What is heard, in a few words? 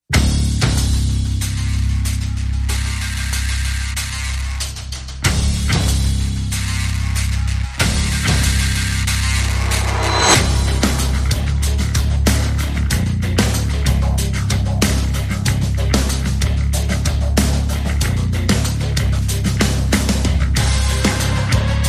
No Guitars No Choir